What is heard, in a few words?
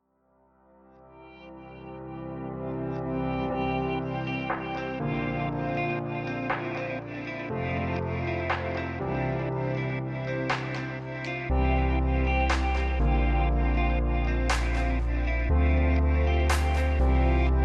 chill timelapse